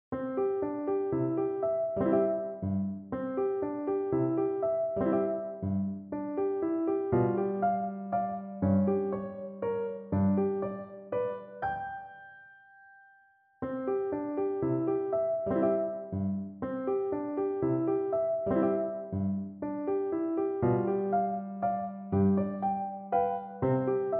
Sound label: valse lente, piano; delibes